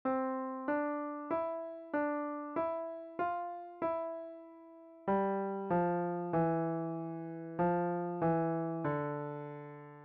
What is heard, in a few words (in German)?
Start Up, Klavier